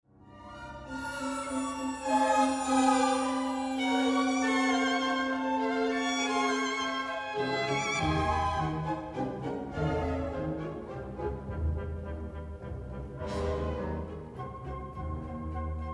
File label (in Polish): glissanda wiolonczel i artykulację drewna; Horenstein; Część wolna prowadzona jest w dość chłodny i przejrzysty sposób; IV Symfonia Gustava Mahlera